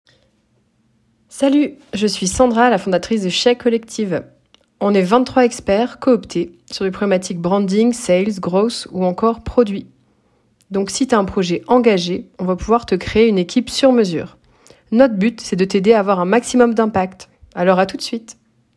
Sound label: vocal